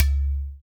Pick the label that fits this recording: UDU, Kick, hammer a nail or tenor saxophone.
UDU